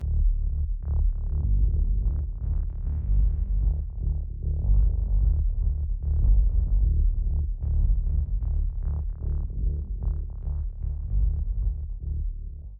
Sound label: synthe saw bass automation